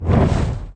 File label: demon fireball throw; veznan